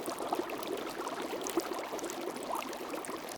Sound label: flametrail loop; Smite; Sol